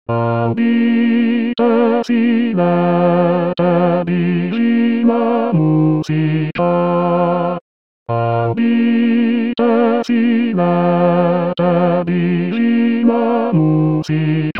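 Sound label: bass; Audite Silete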